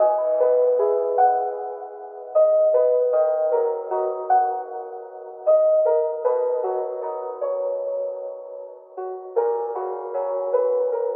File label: piano sad, sad loop; loops